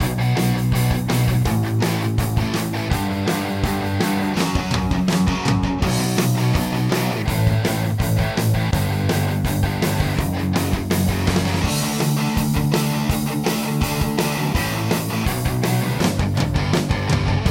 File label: Extra Attitude Punk Rock D 165 bpm